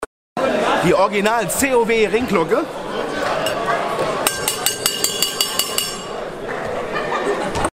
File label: cow ring glocke